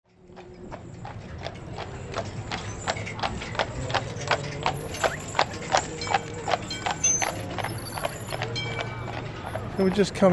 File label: Melbourne Soundscape